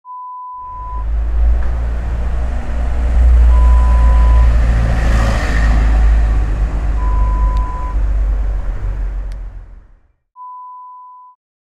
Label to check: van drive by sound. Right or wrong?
Right